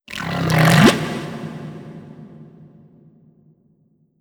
Critter Male Verb; moul; Kahlo

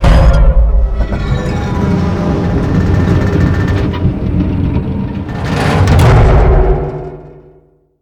Herring Door Open; Guild

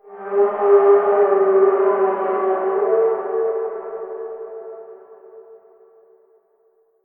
Shroomie Echo

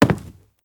Soft Wood Walk

